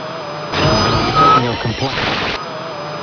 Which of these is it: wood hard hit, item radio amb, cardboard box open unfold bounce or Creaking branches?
item radio amb